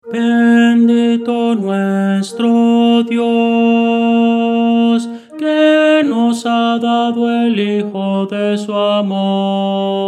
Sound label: tenor